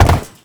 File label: land perforated metal; Movement; Warframe; Uriel